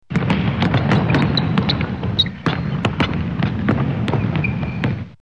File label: basketball playing